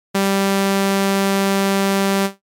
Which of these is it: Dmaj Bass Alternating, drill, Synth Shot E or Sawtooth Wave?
Sawtooth Wave